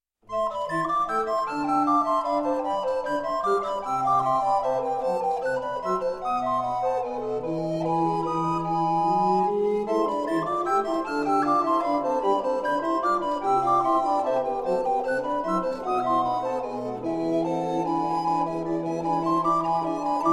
Menuet

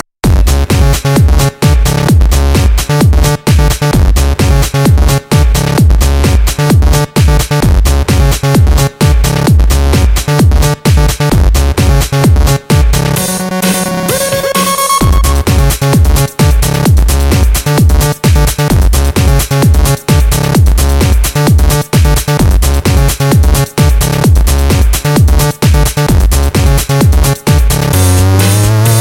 Electro Groove

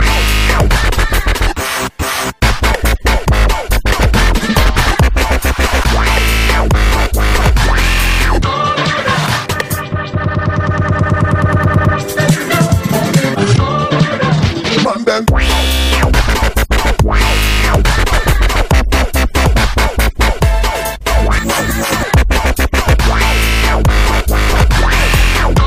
Dj Effect

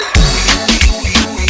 Uy Uy Uy

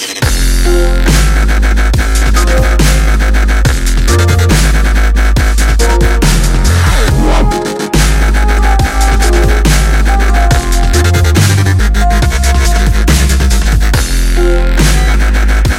DubStep Intense

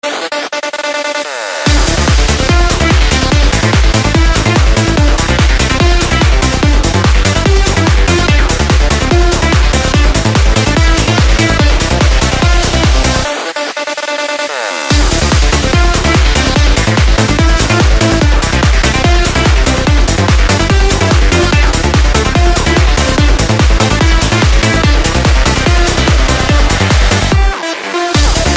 Japan Trance

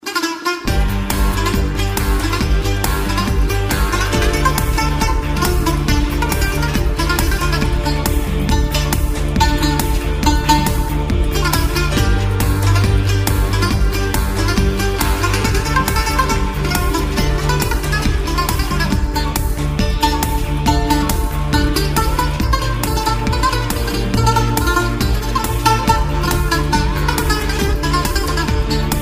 Uyghur

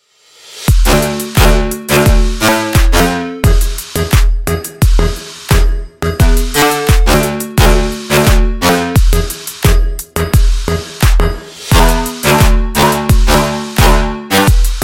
Thumping Beat

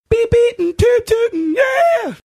Beep Beep

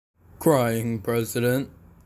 Crying; President

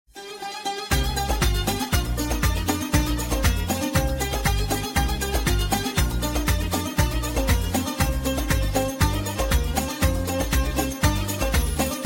Arabic Music

